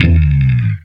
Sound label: Wobble